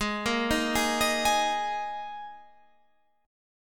Gsharp Suspended 2nd

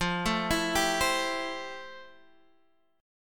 F Major 9th Guitar DADGAD

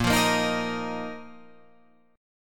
A sharp Suspended 4th Guitar Open F; strummed; A#sus4; JGuitar

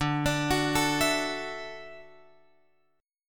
D-Major Add 9th D

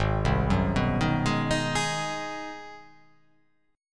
G sharp 7th Sharp 9th

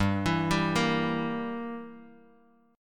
G Minor 6th, Guitar Open D

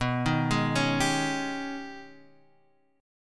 Ab Minor Major 11th B

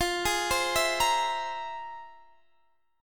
F Minor Major 11th Guitar Drop D, chord; x,20,18,17,17,18; down; chord shape

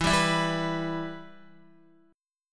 E-Minor, Guitar; strummed; JGuitar

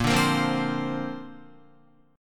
Bb Major Add 9th, Guitar; strummed; JGuitar

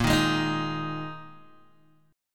A sharp 7th Suspended 4th A sharp, Guitar Open G

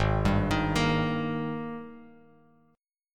Ab Major 7th Suspended 2nd Ab down Bass Standard; arpeggiated

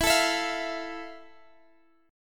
F Major 7th E

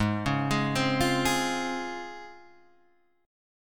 Db Major 7th Ab

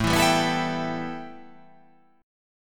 A 7th Suspended 2nd

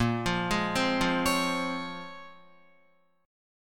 Bb Minor 9th Bb, Guitar; Bbm9; arpeggiated; JGuitar

